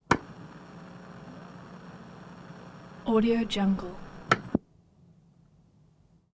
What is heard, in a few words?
television